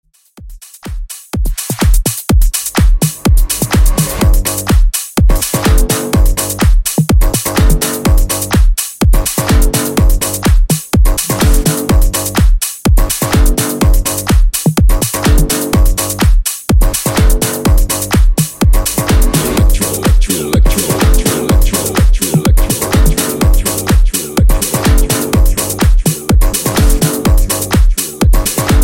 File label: Elektro Funk Bootleg 125Bpm